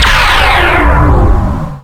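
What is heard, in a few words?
Laser Fire Exterior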